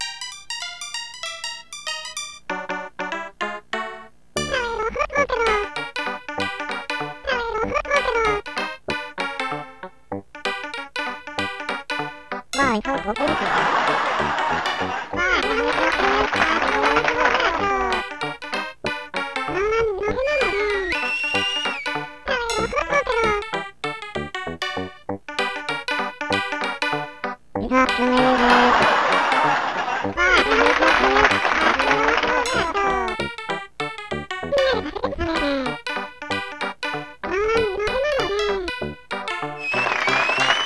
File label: Ragtime; animal crossing let's go to the city